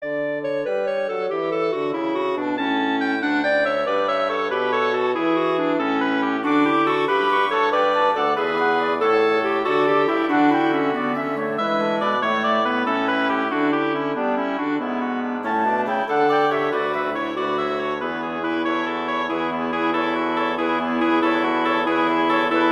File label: Gigue; Pachelbel; Canon